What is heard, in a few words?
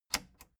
plastic button latch loose click enable slow